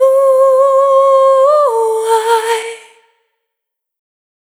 Out of Body, Vocal Samples, Single Voice Melodic Loops; Singles